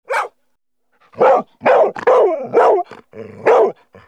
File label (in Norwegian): hund